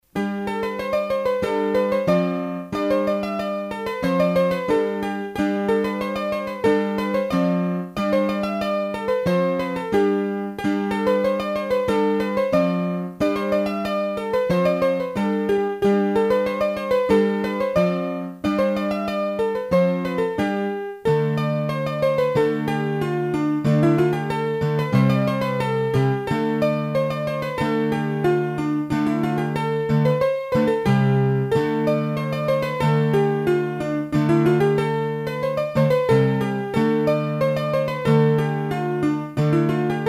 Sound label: Scottische (Auvergne), hurdy-gurdy; Massif Central